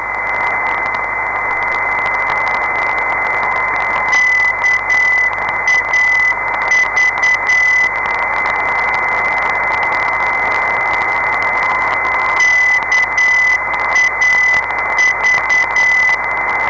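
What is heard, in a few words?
266khz, non-directional beacon; M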